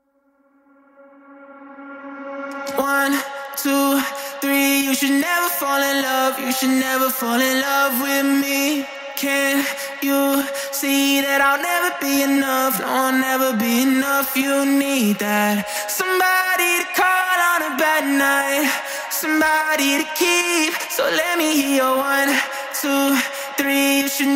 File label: Acapella